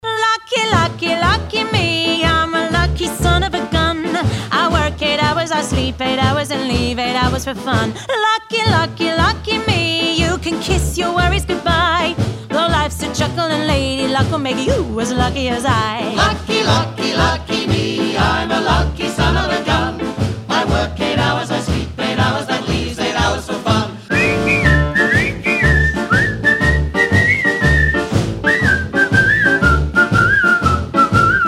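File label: ringtone cheerful music from advert; hyundai tucson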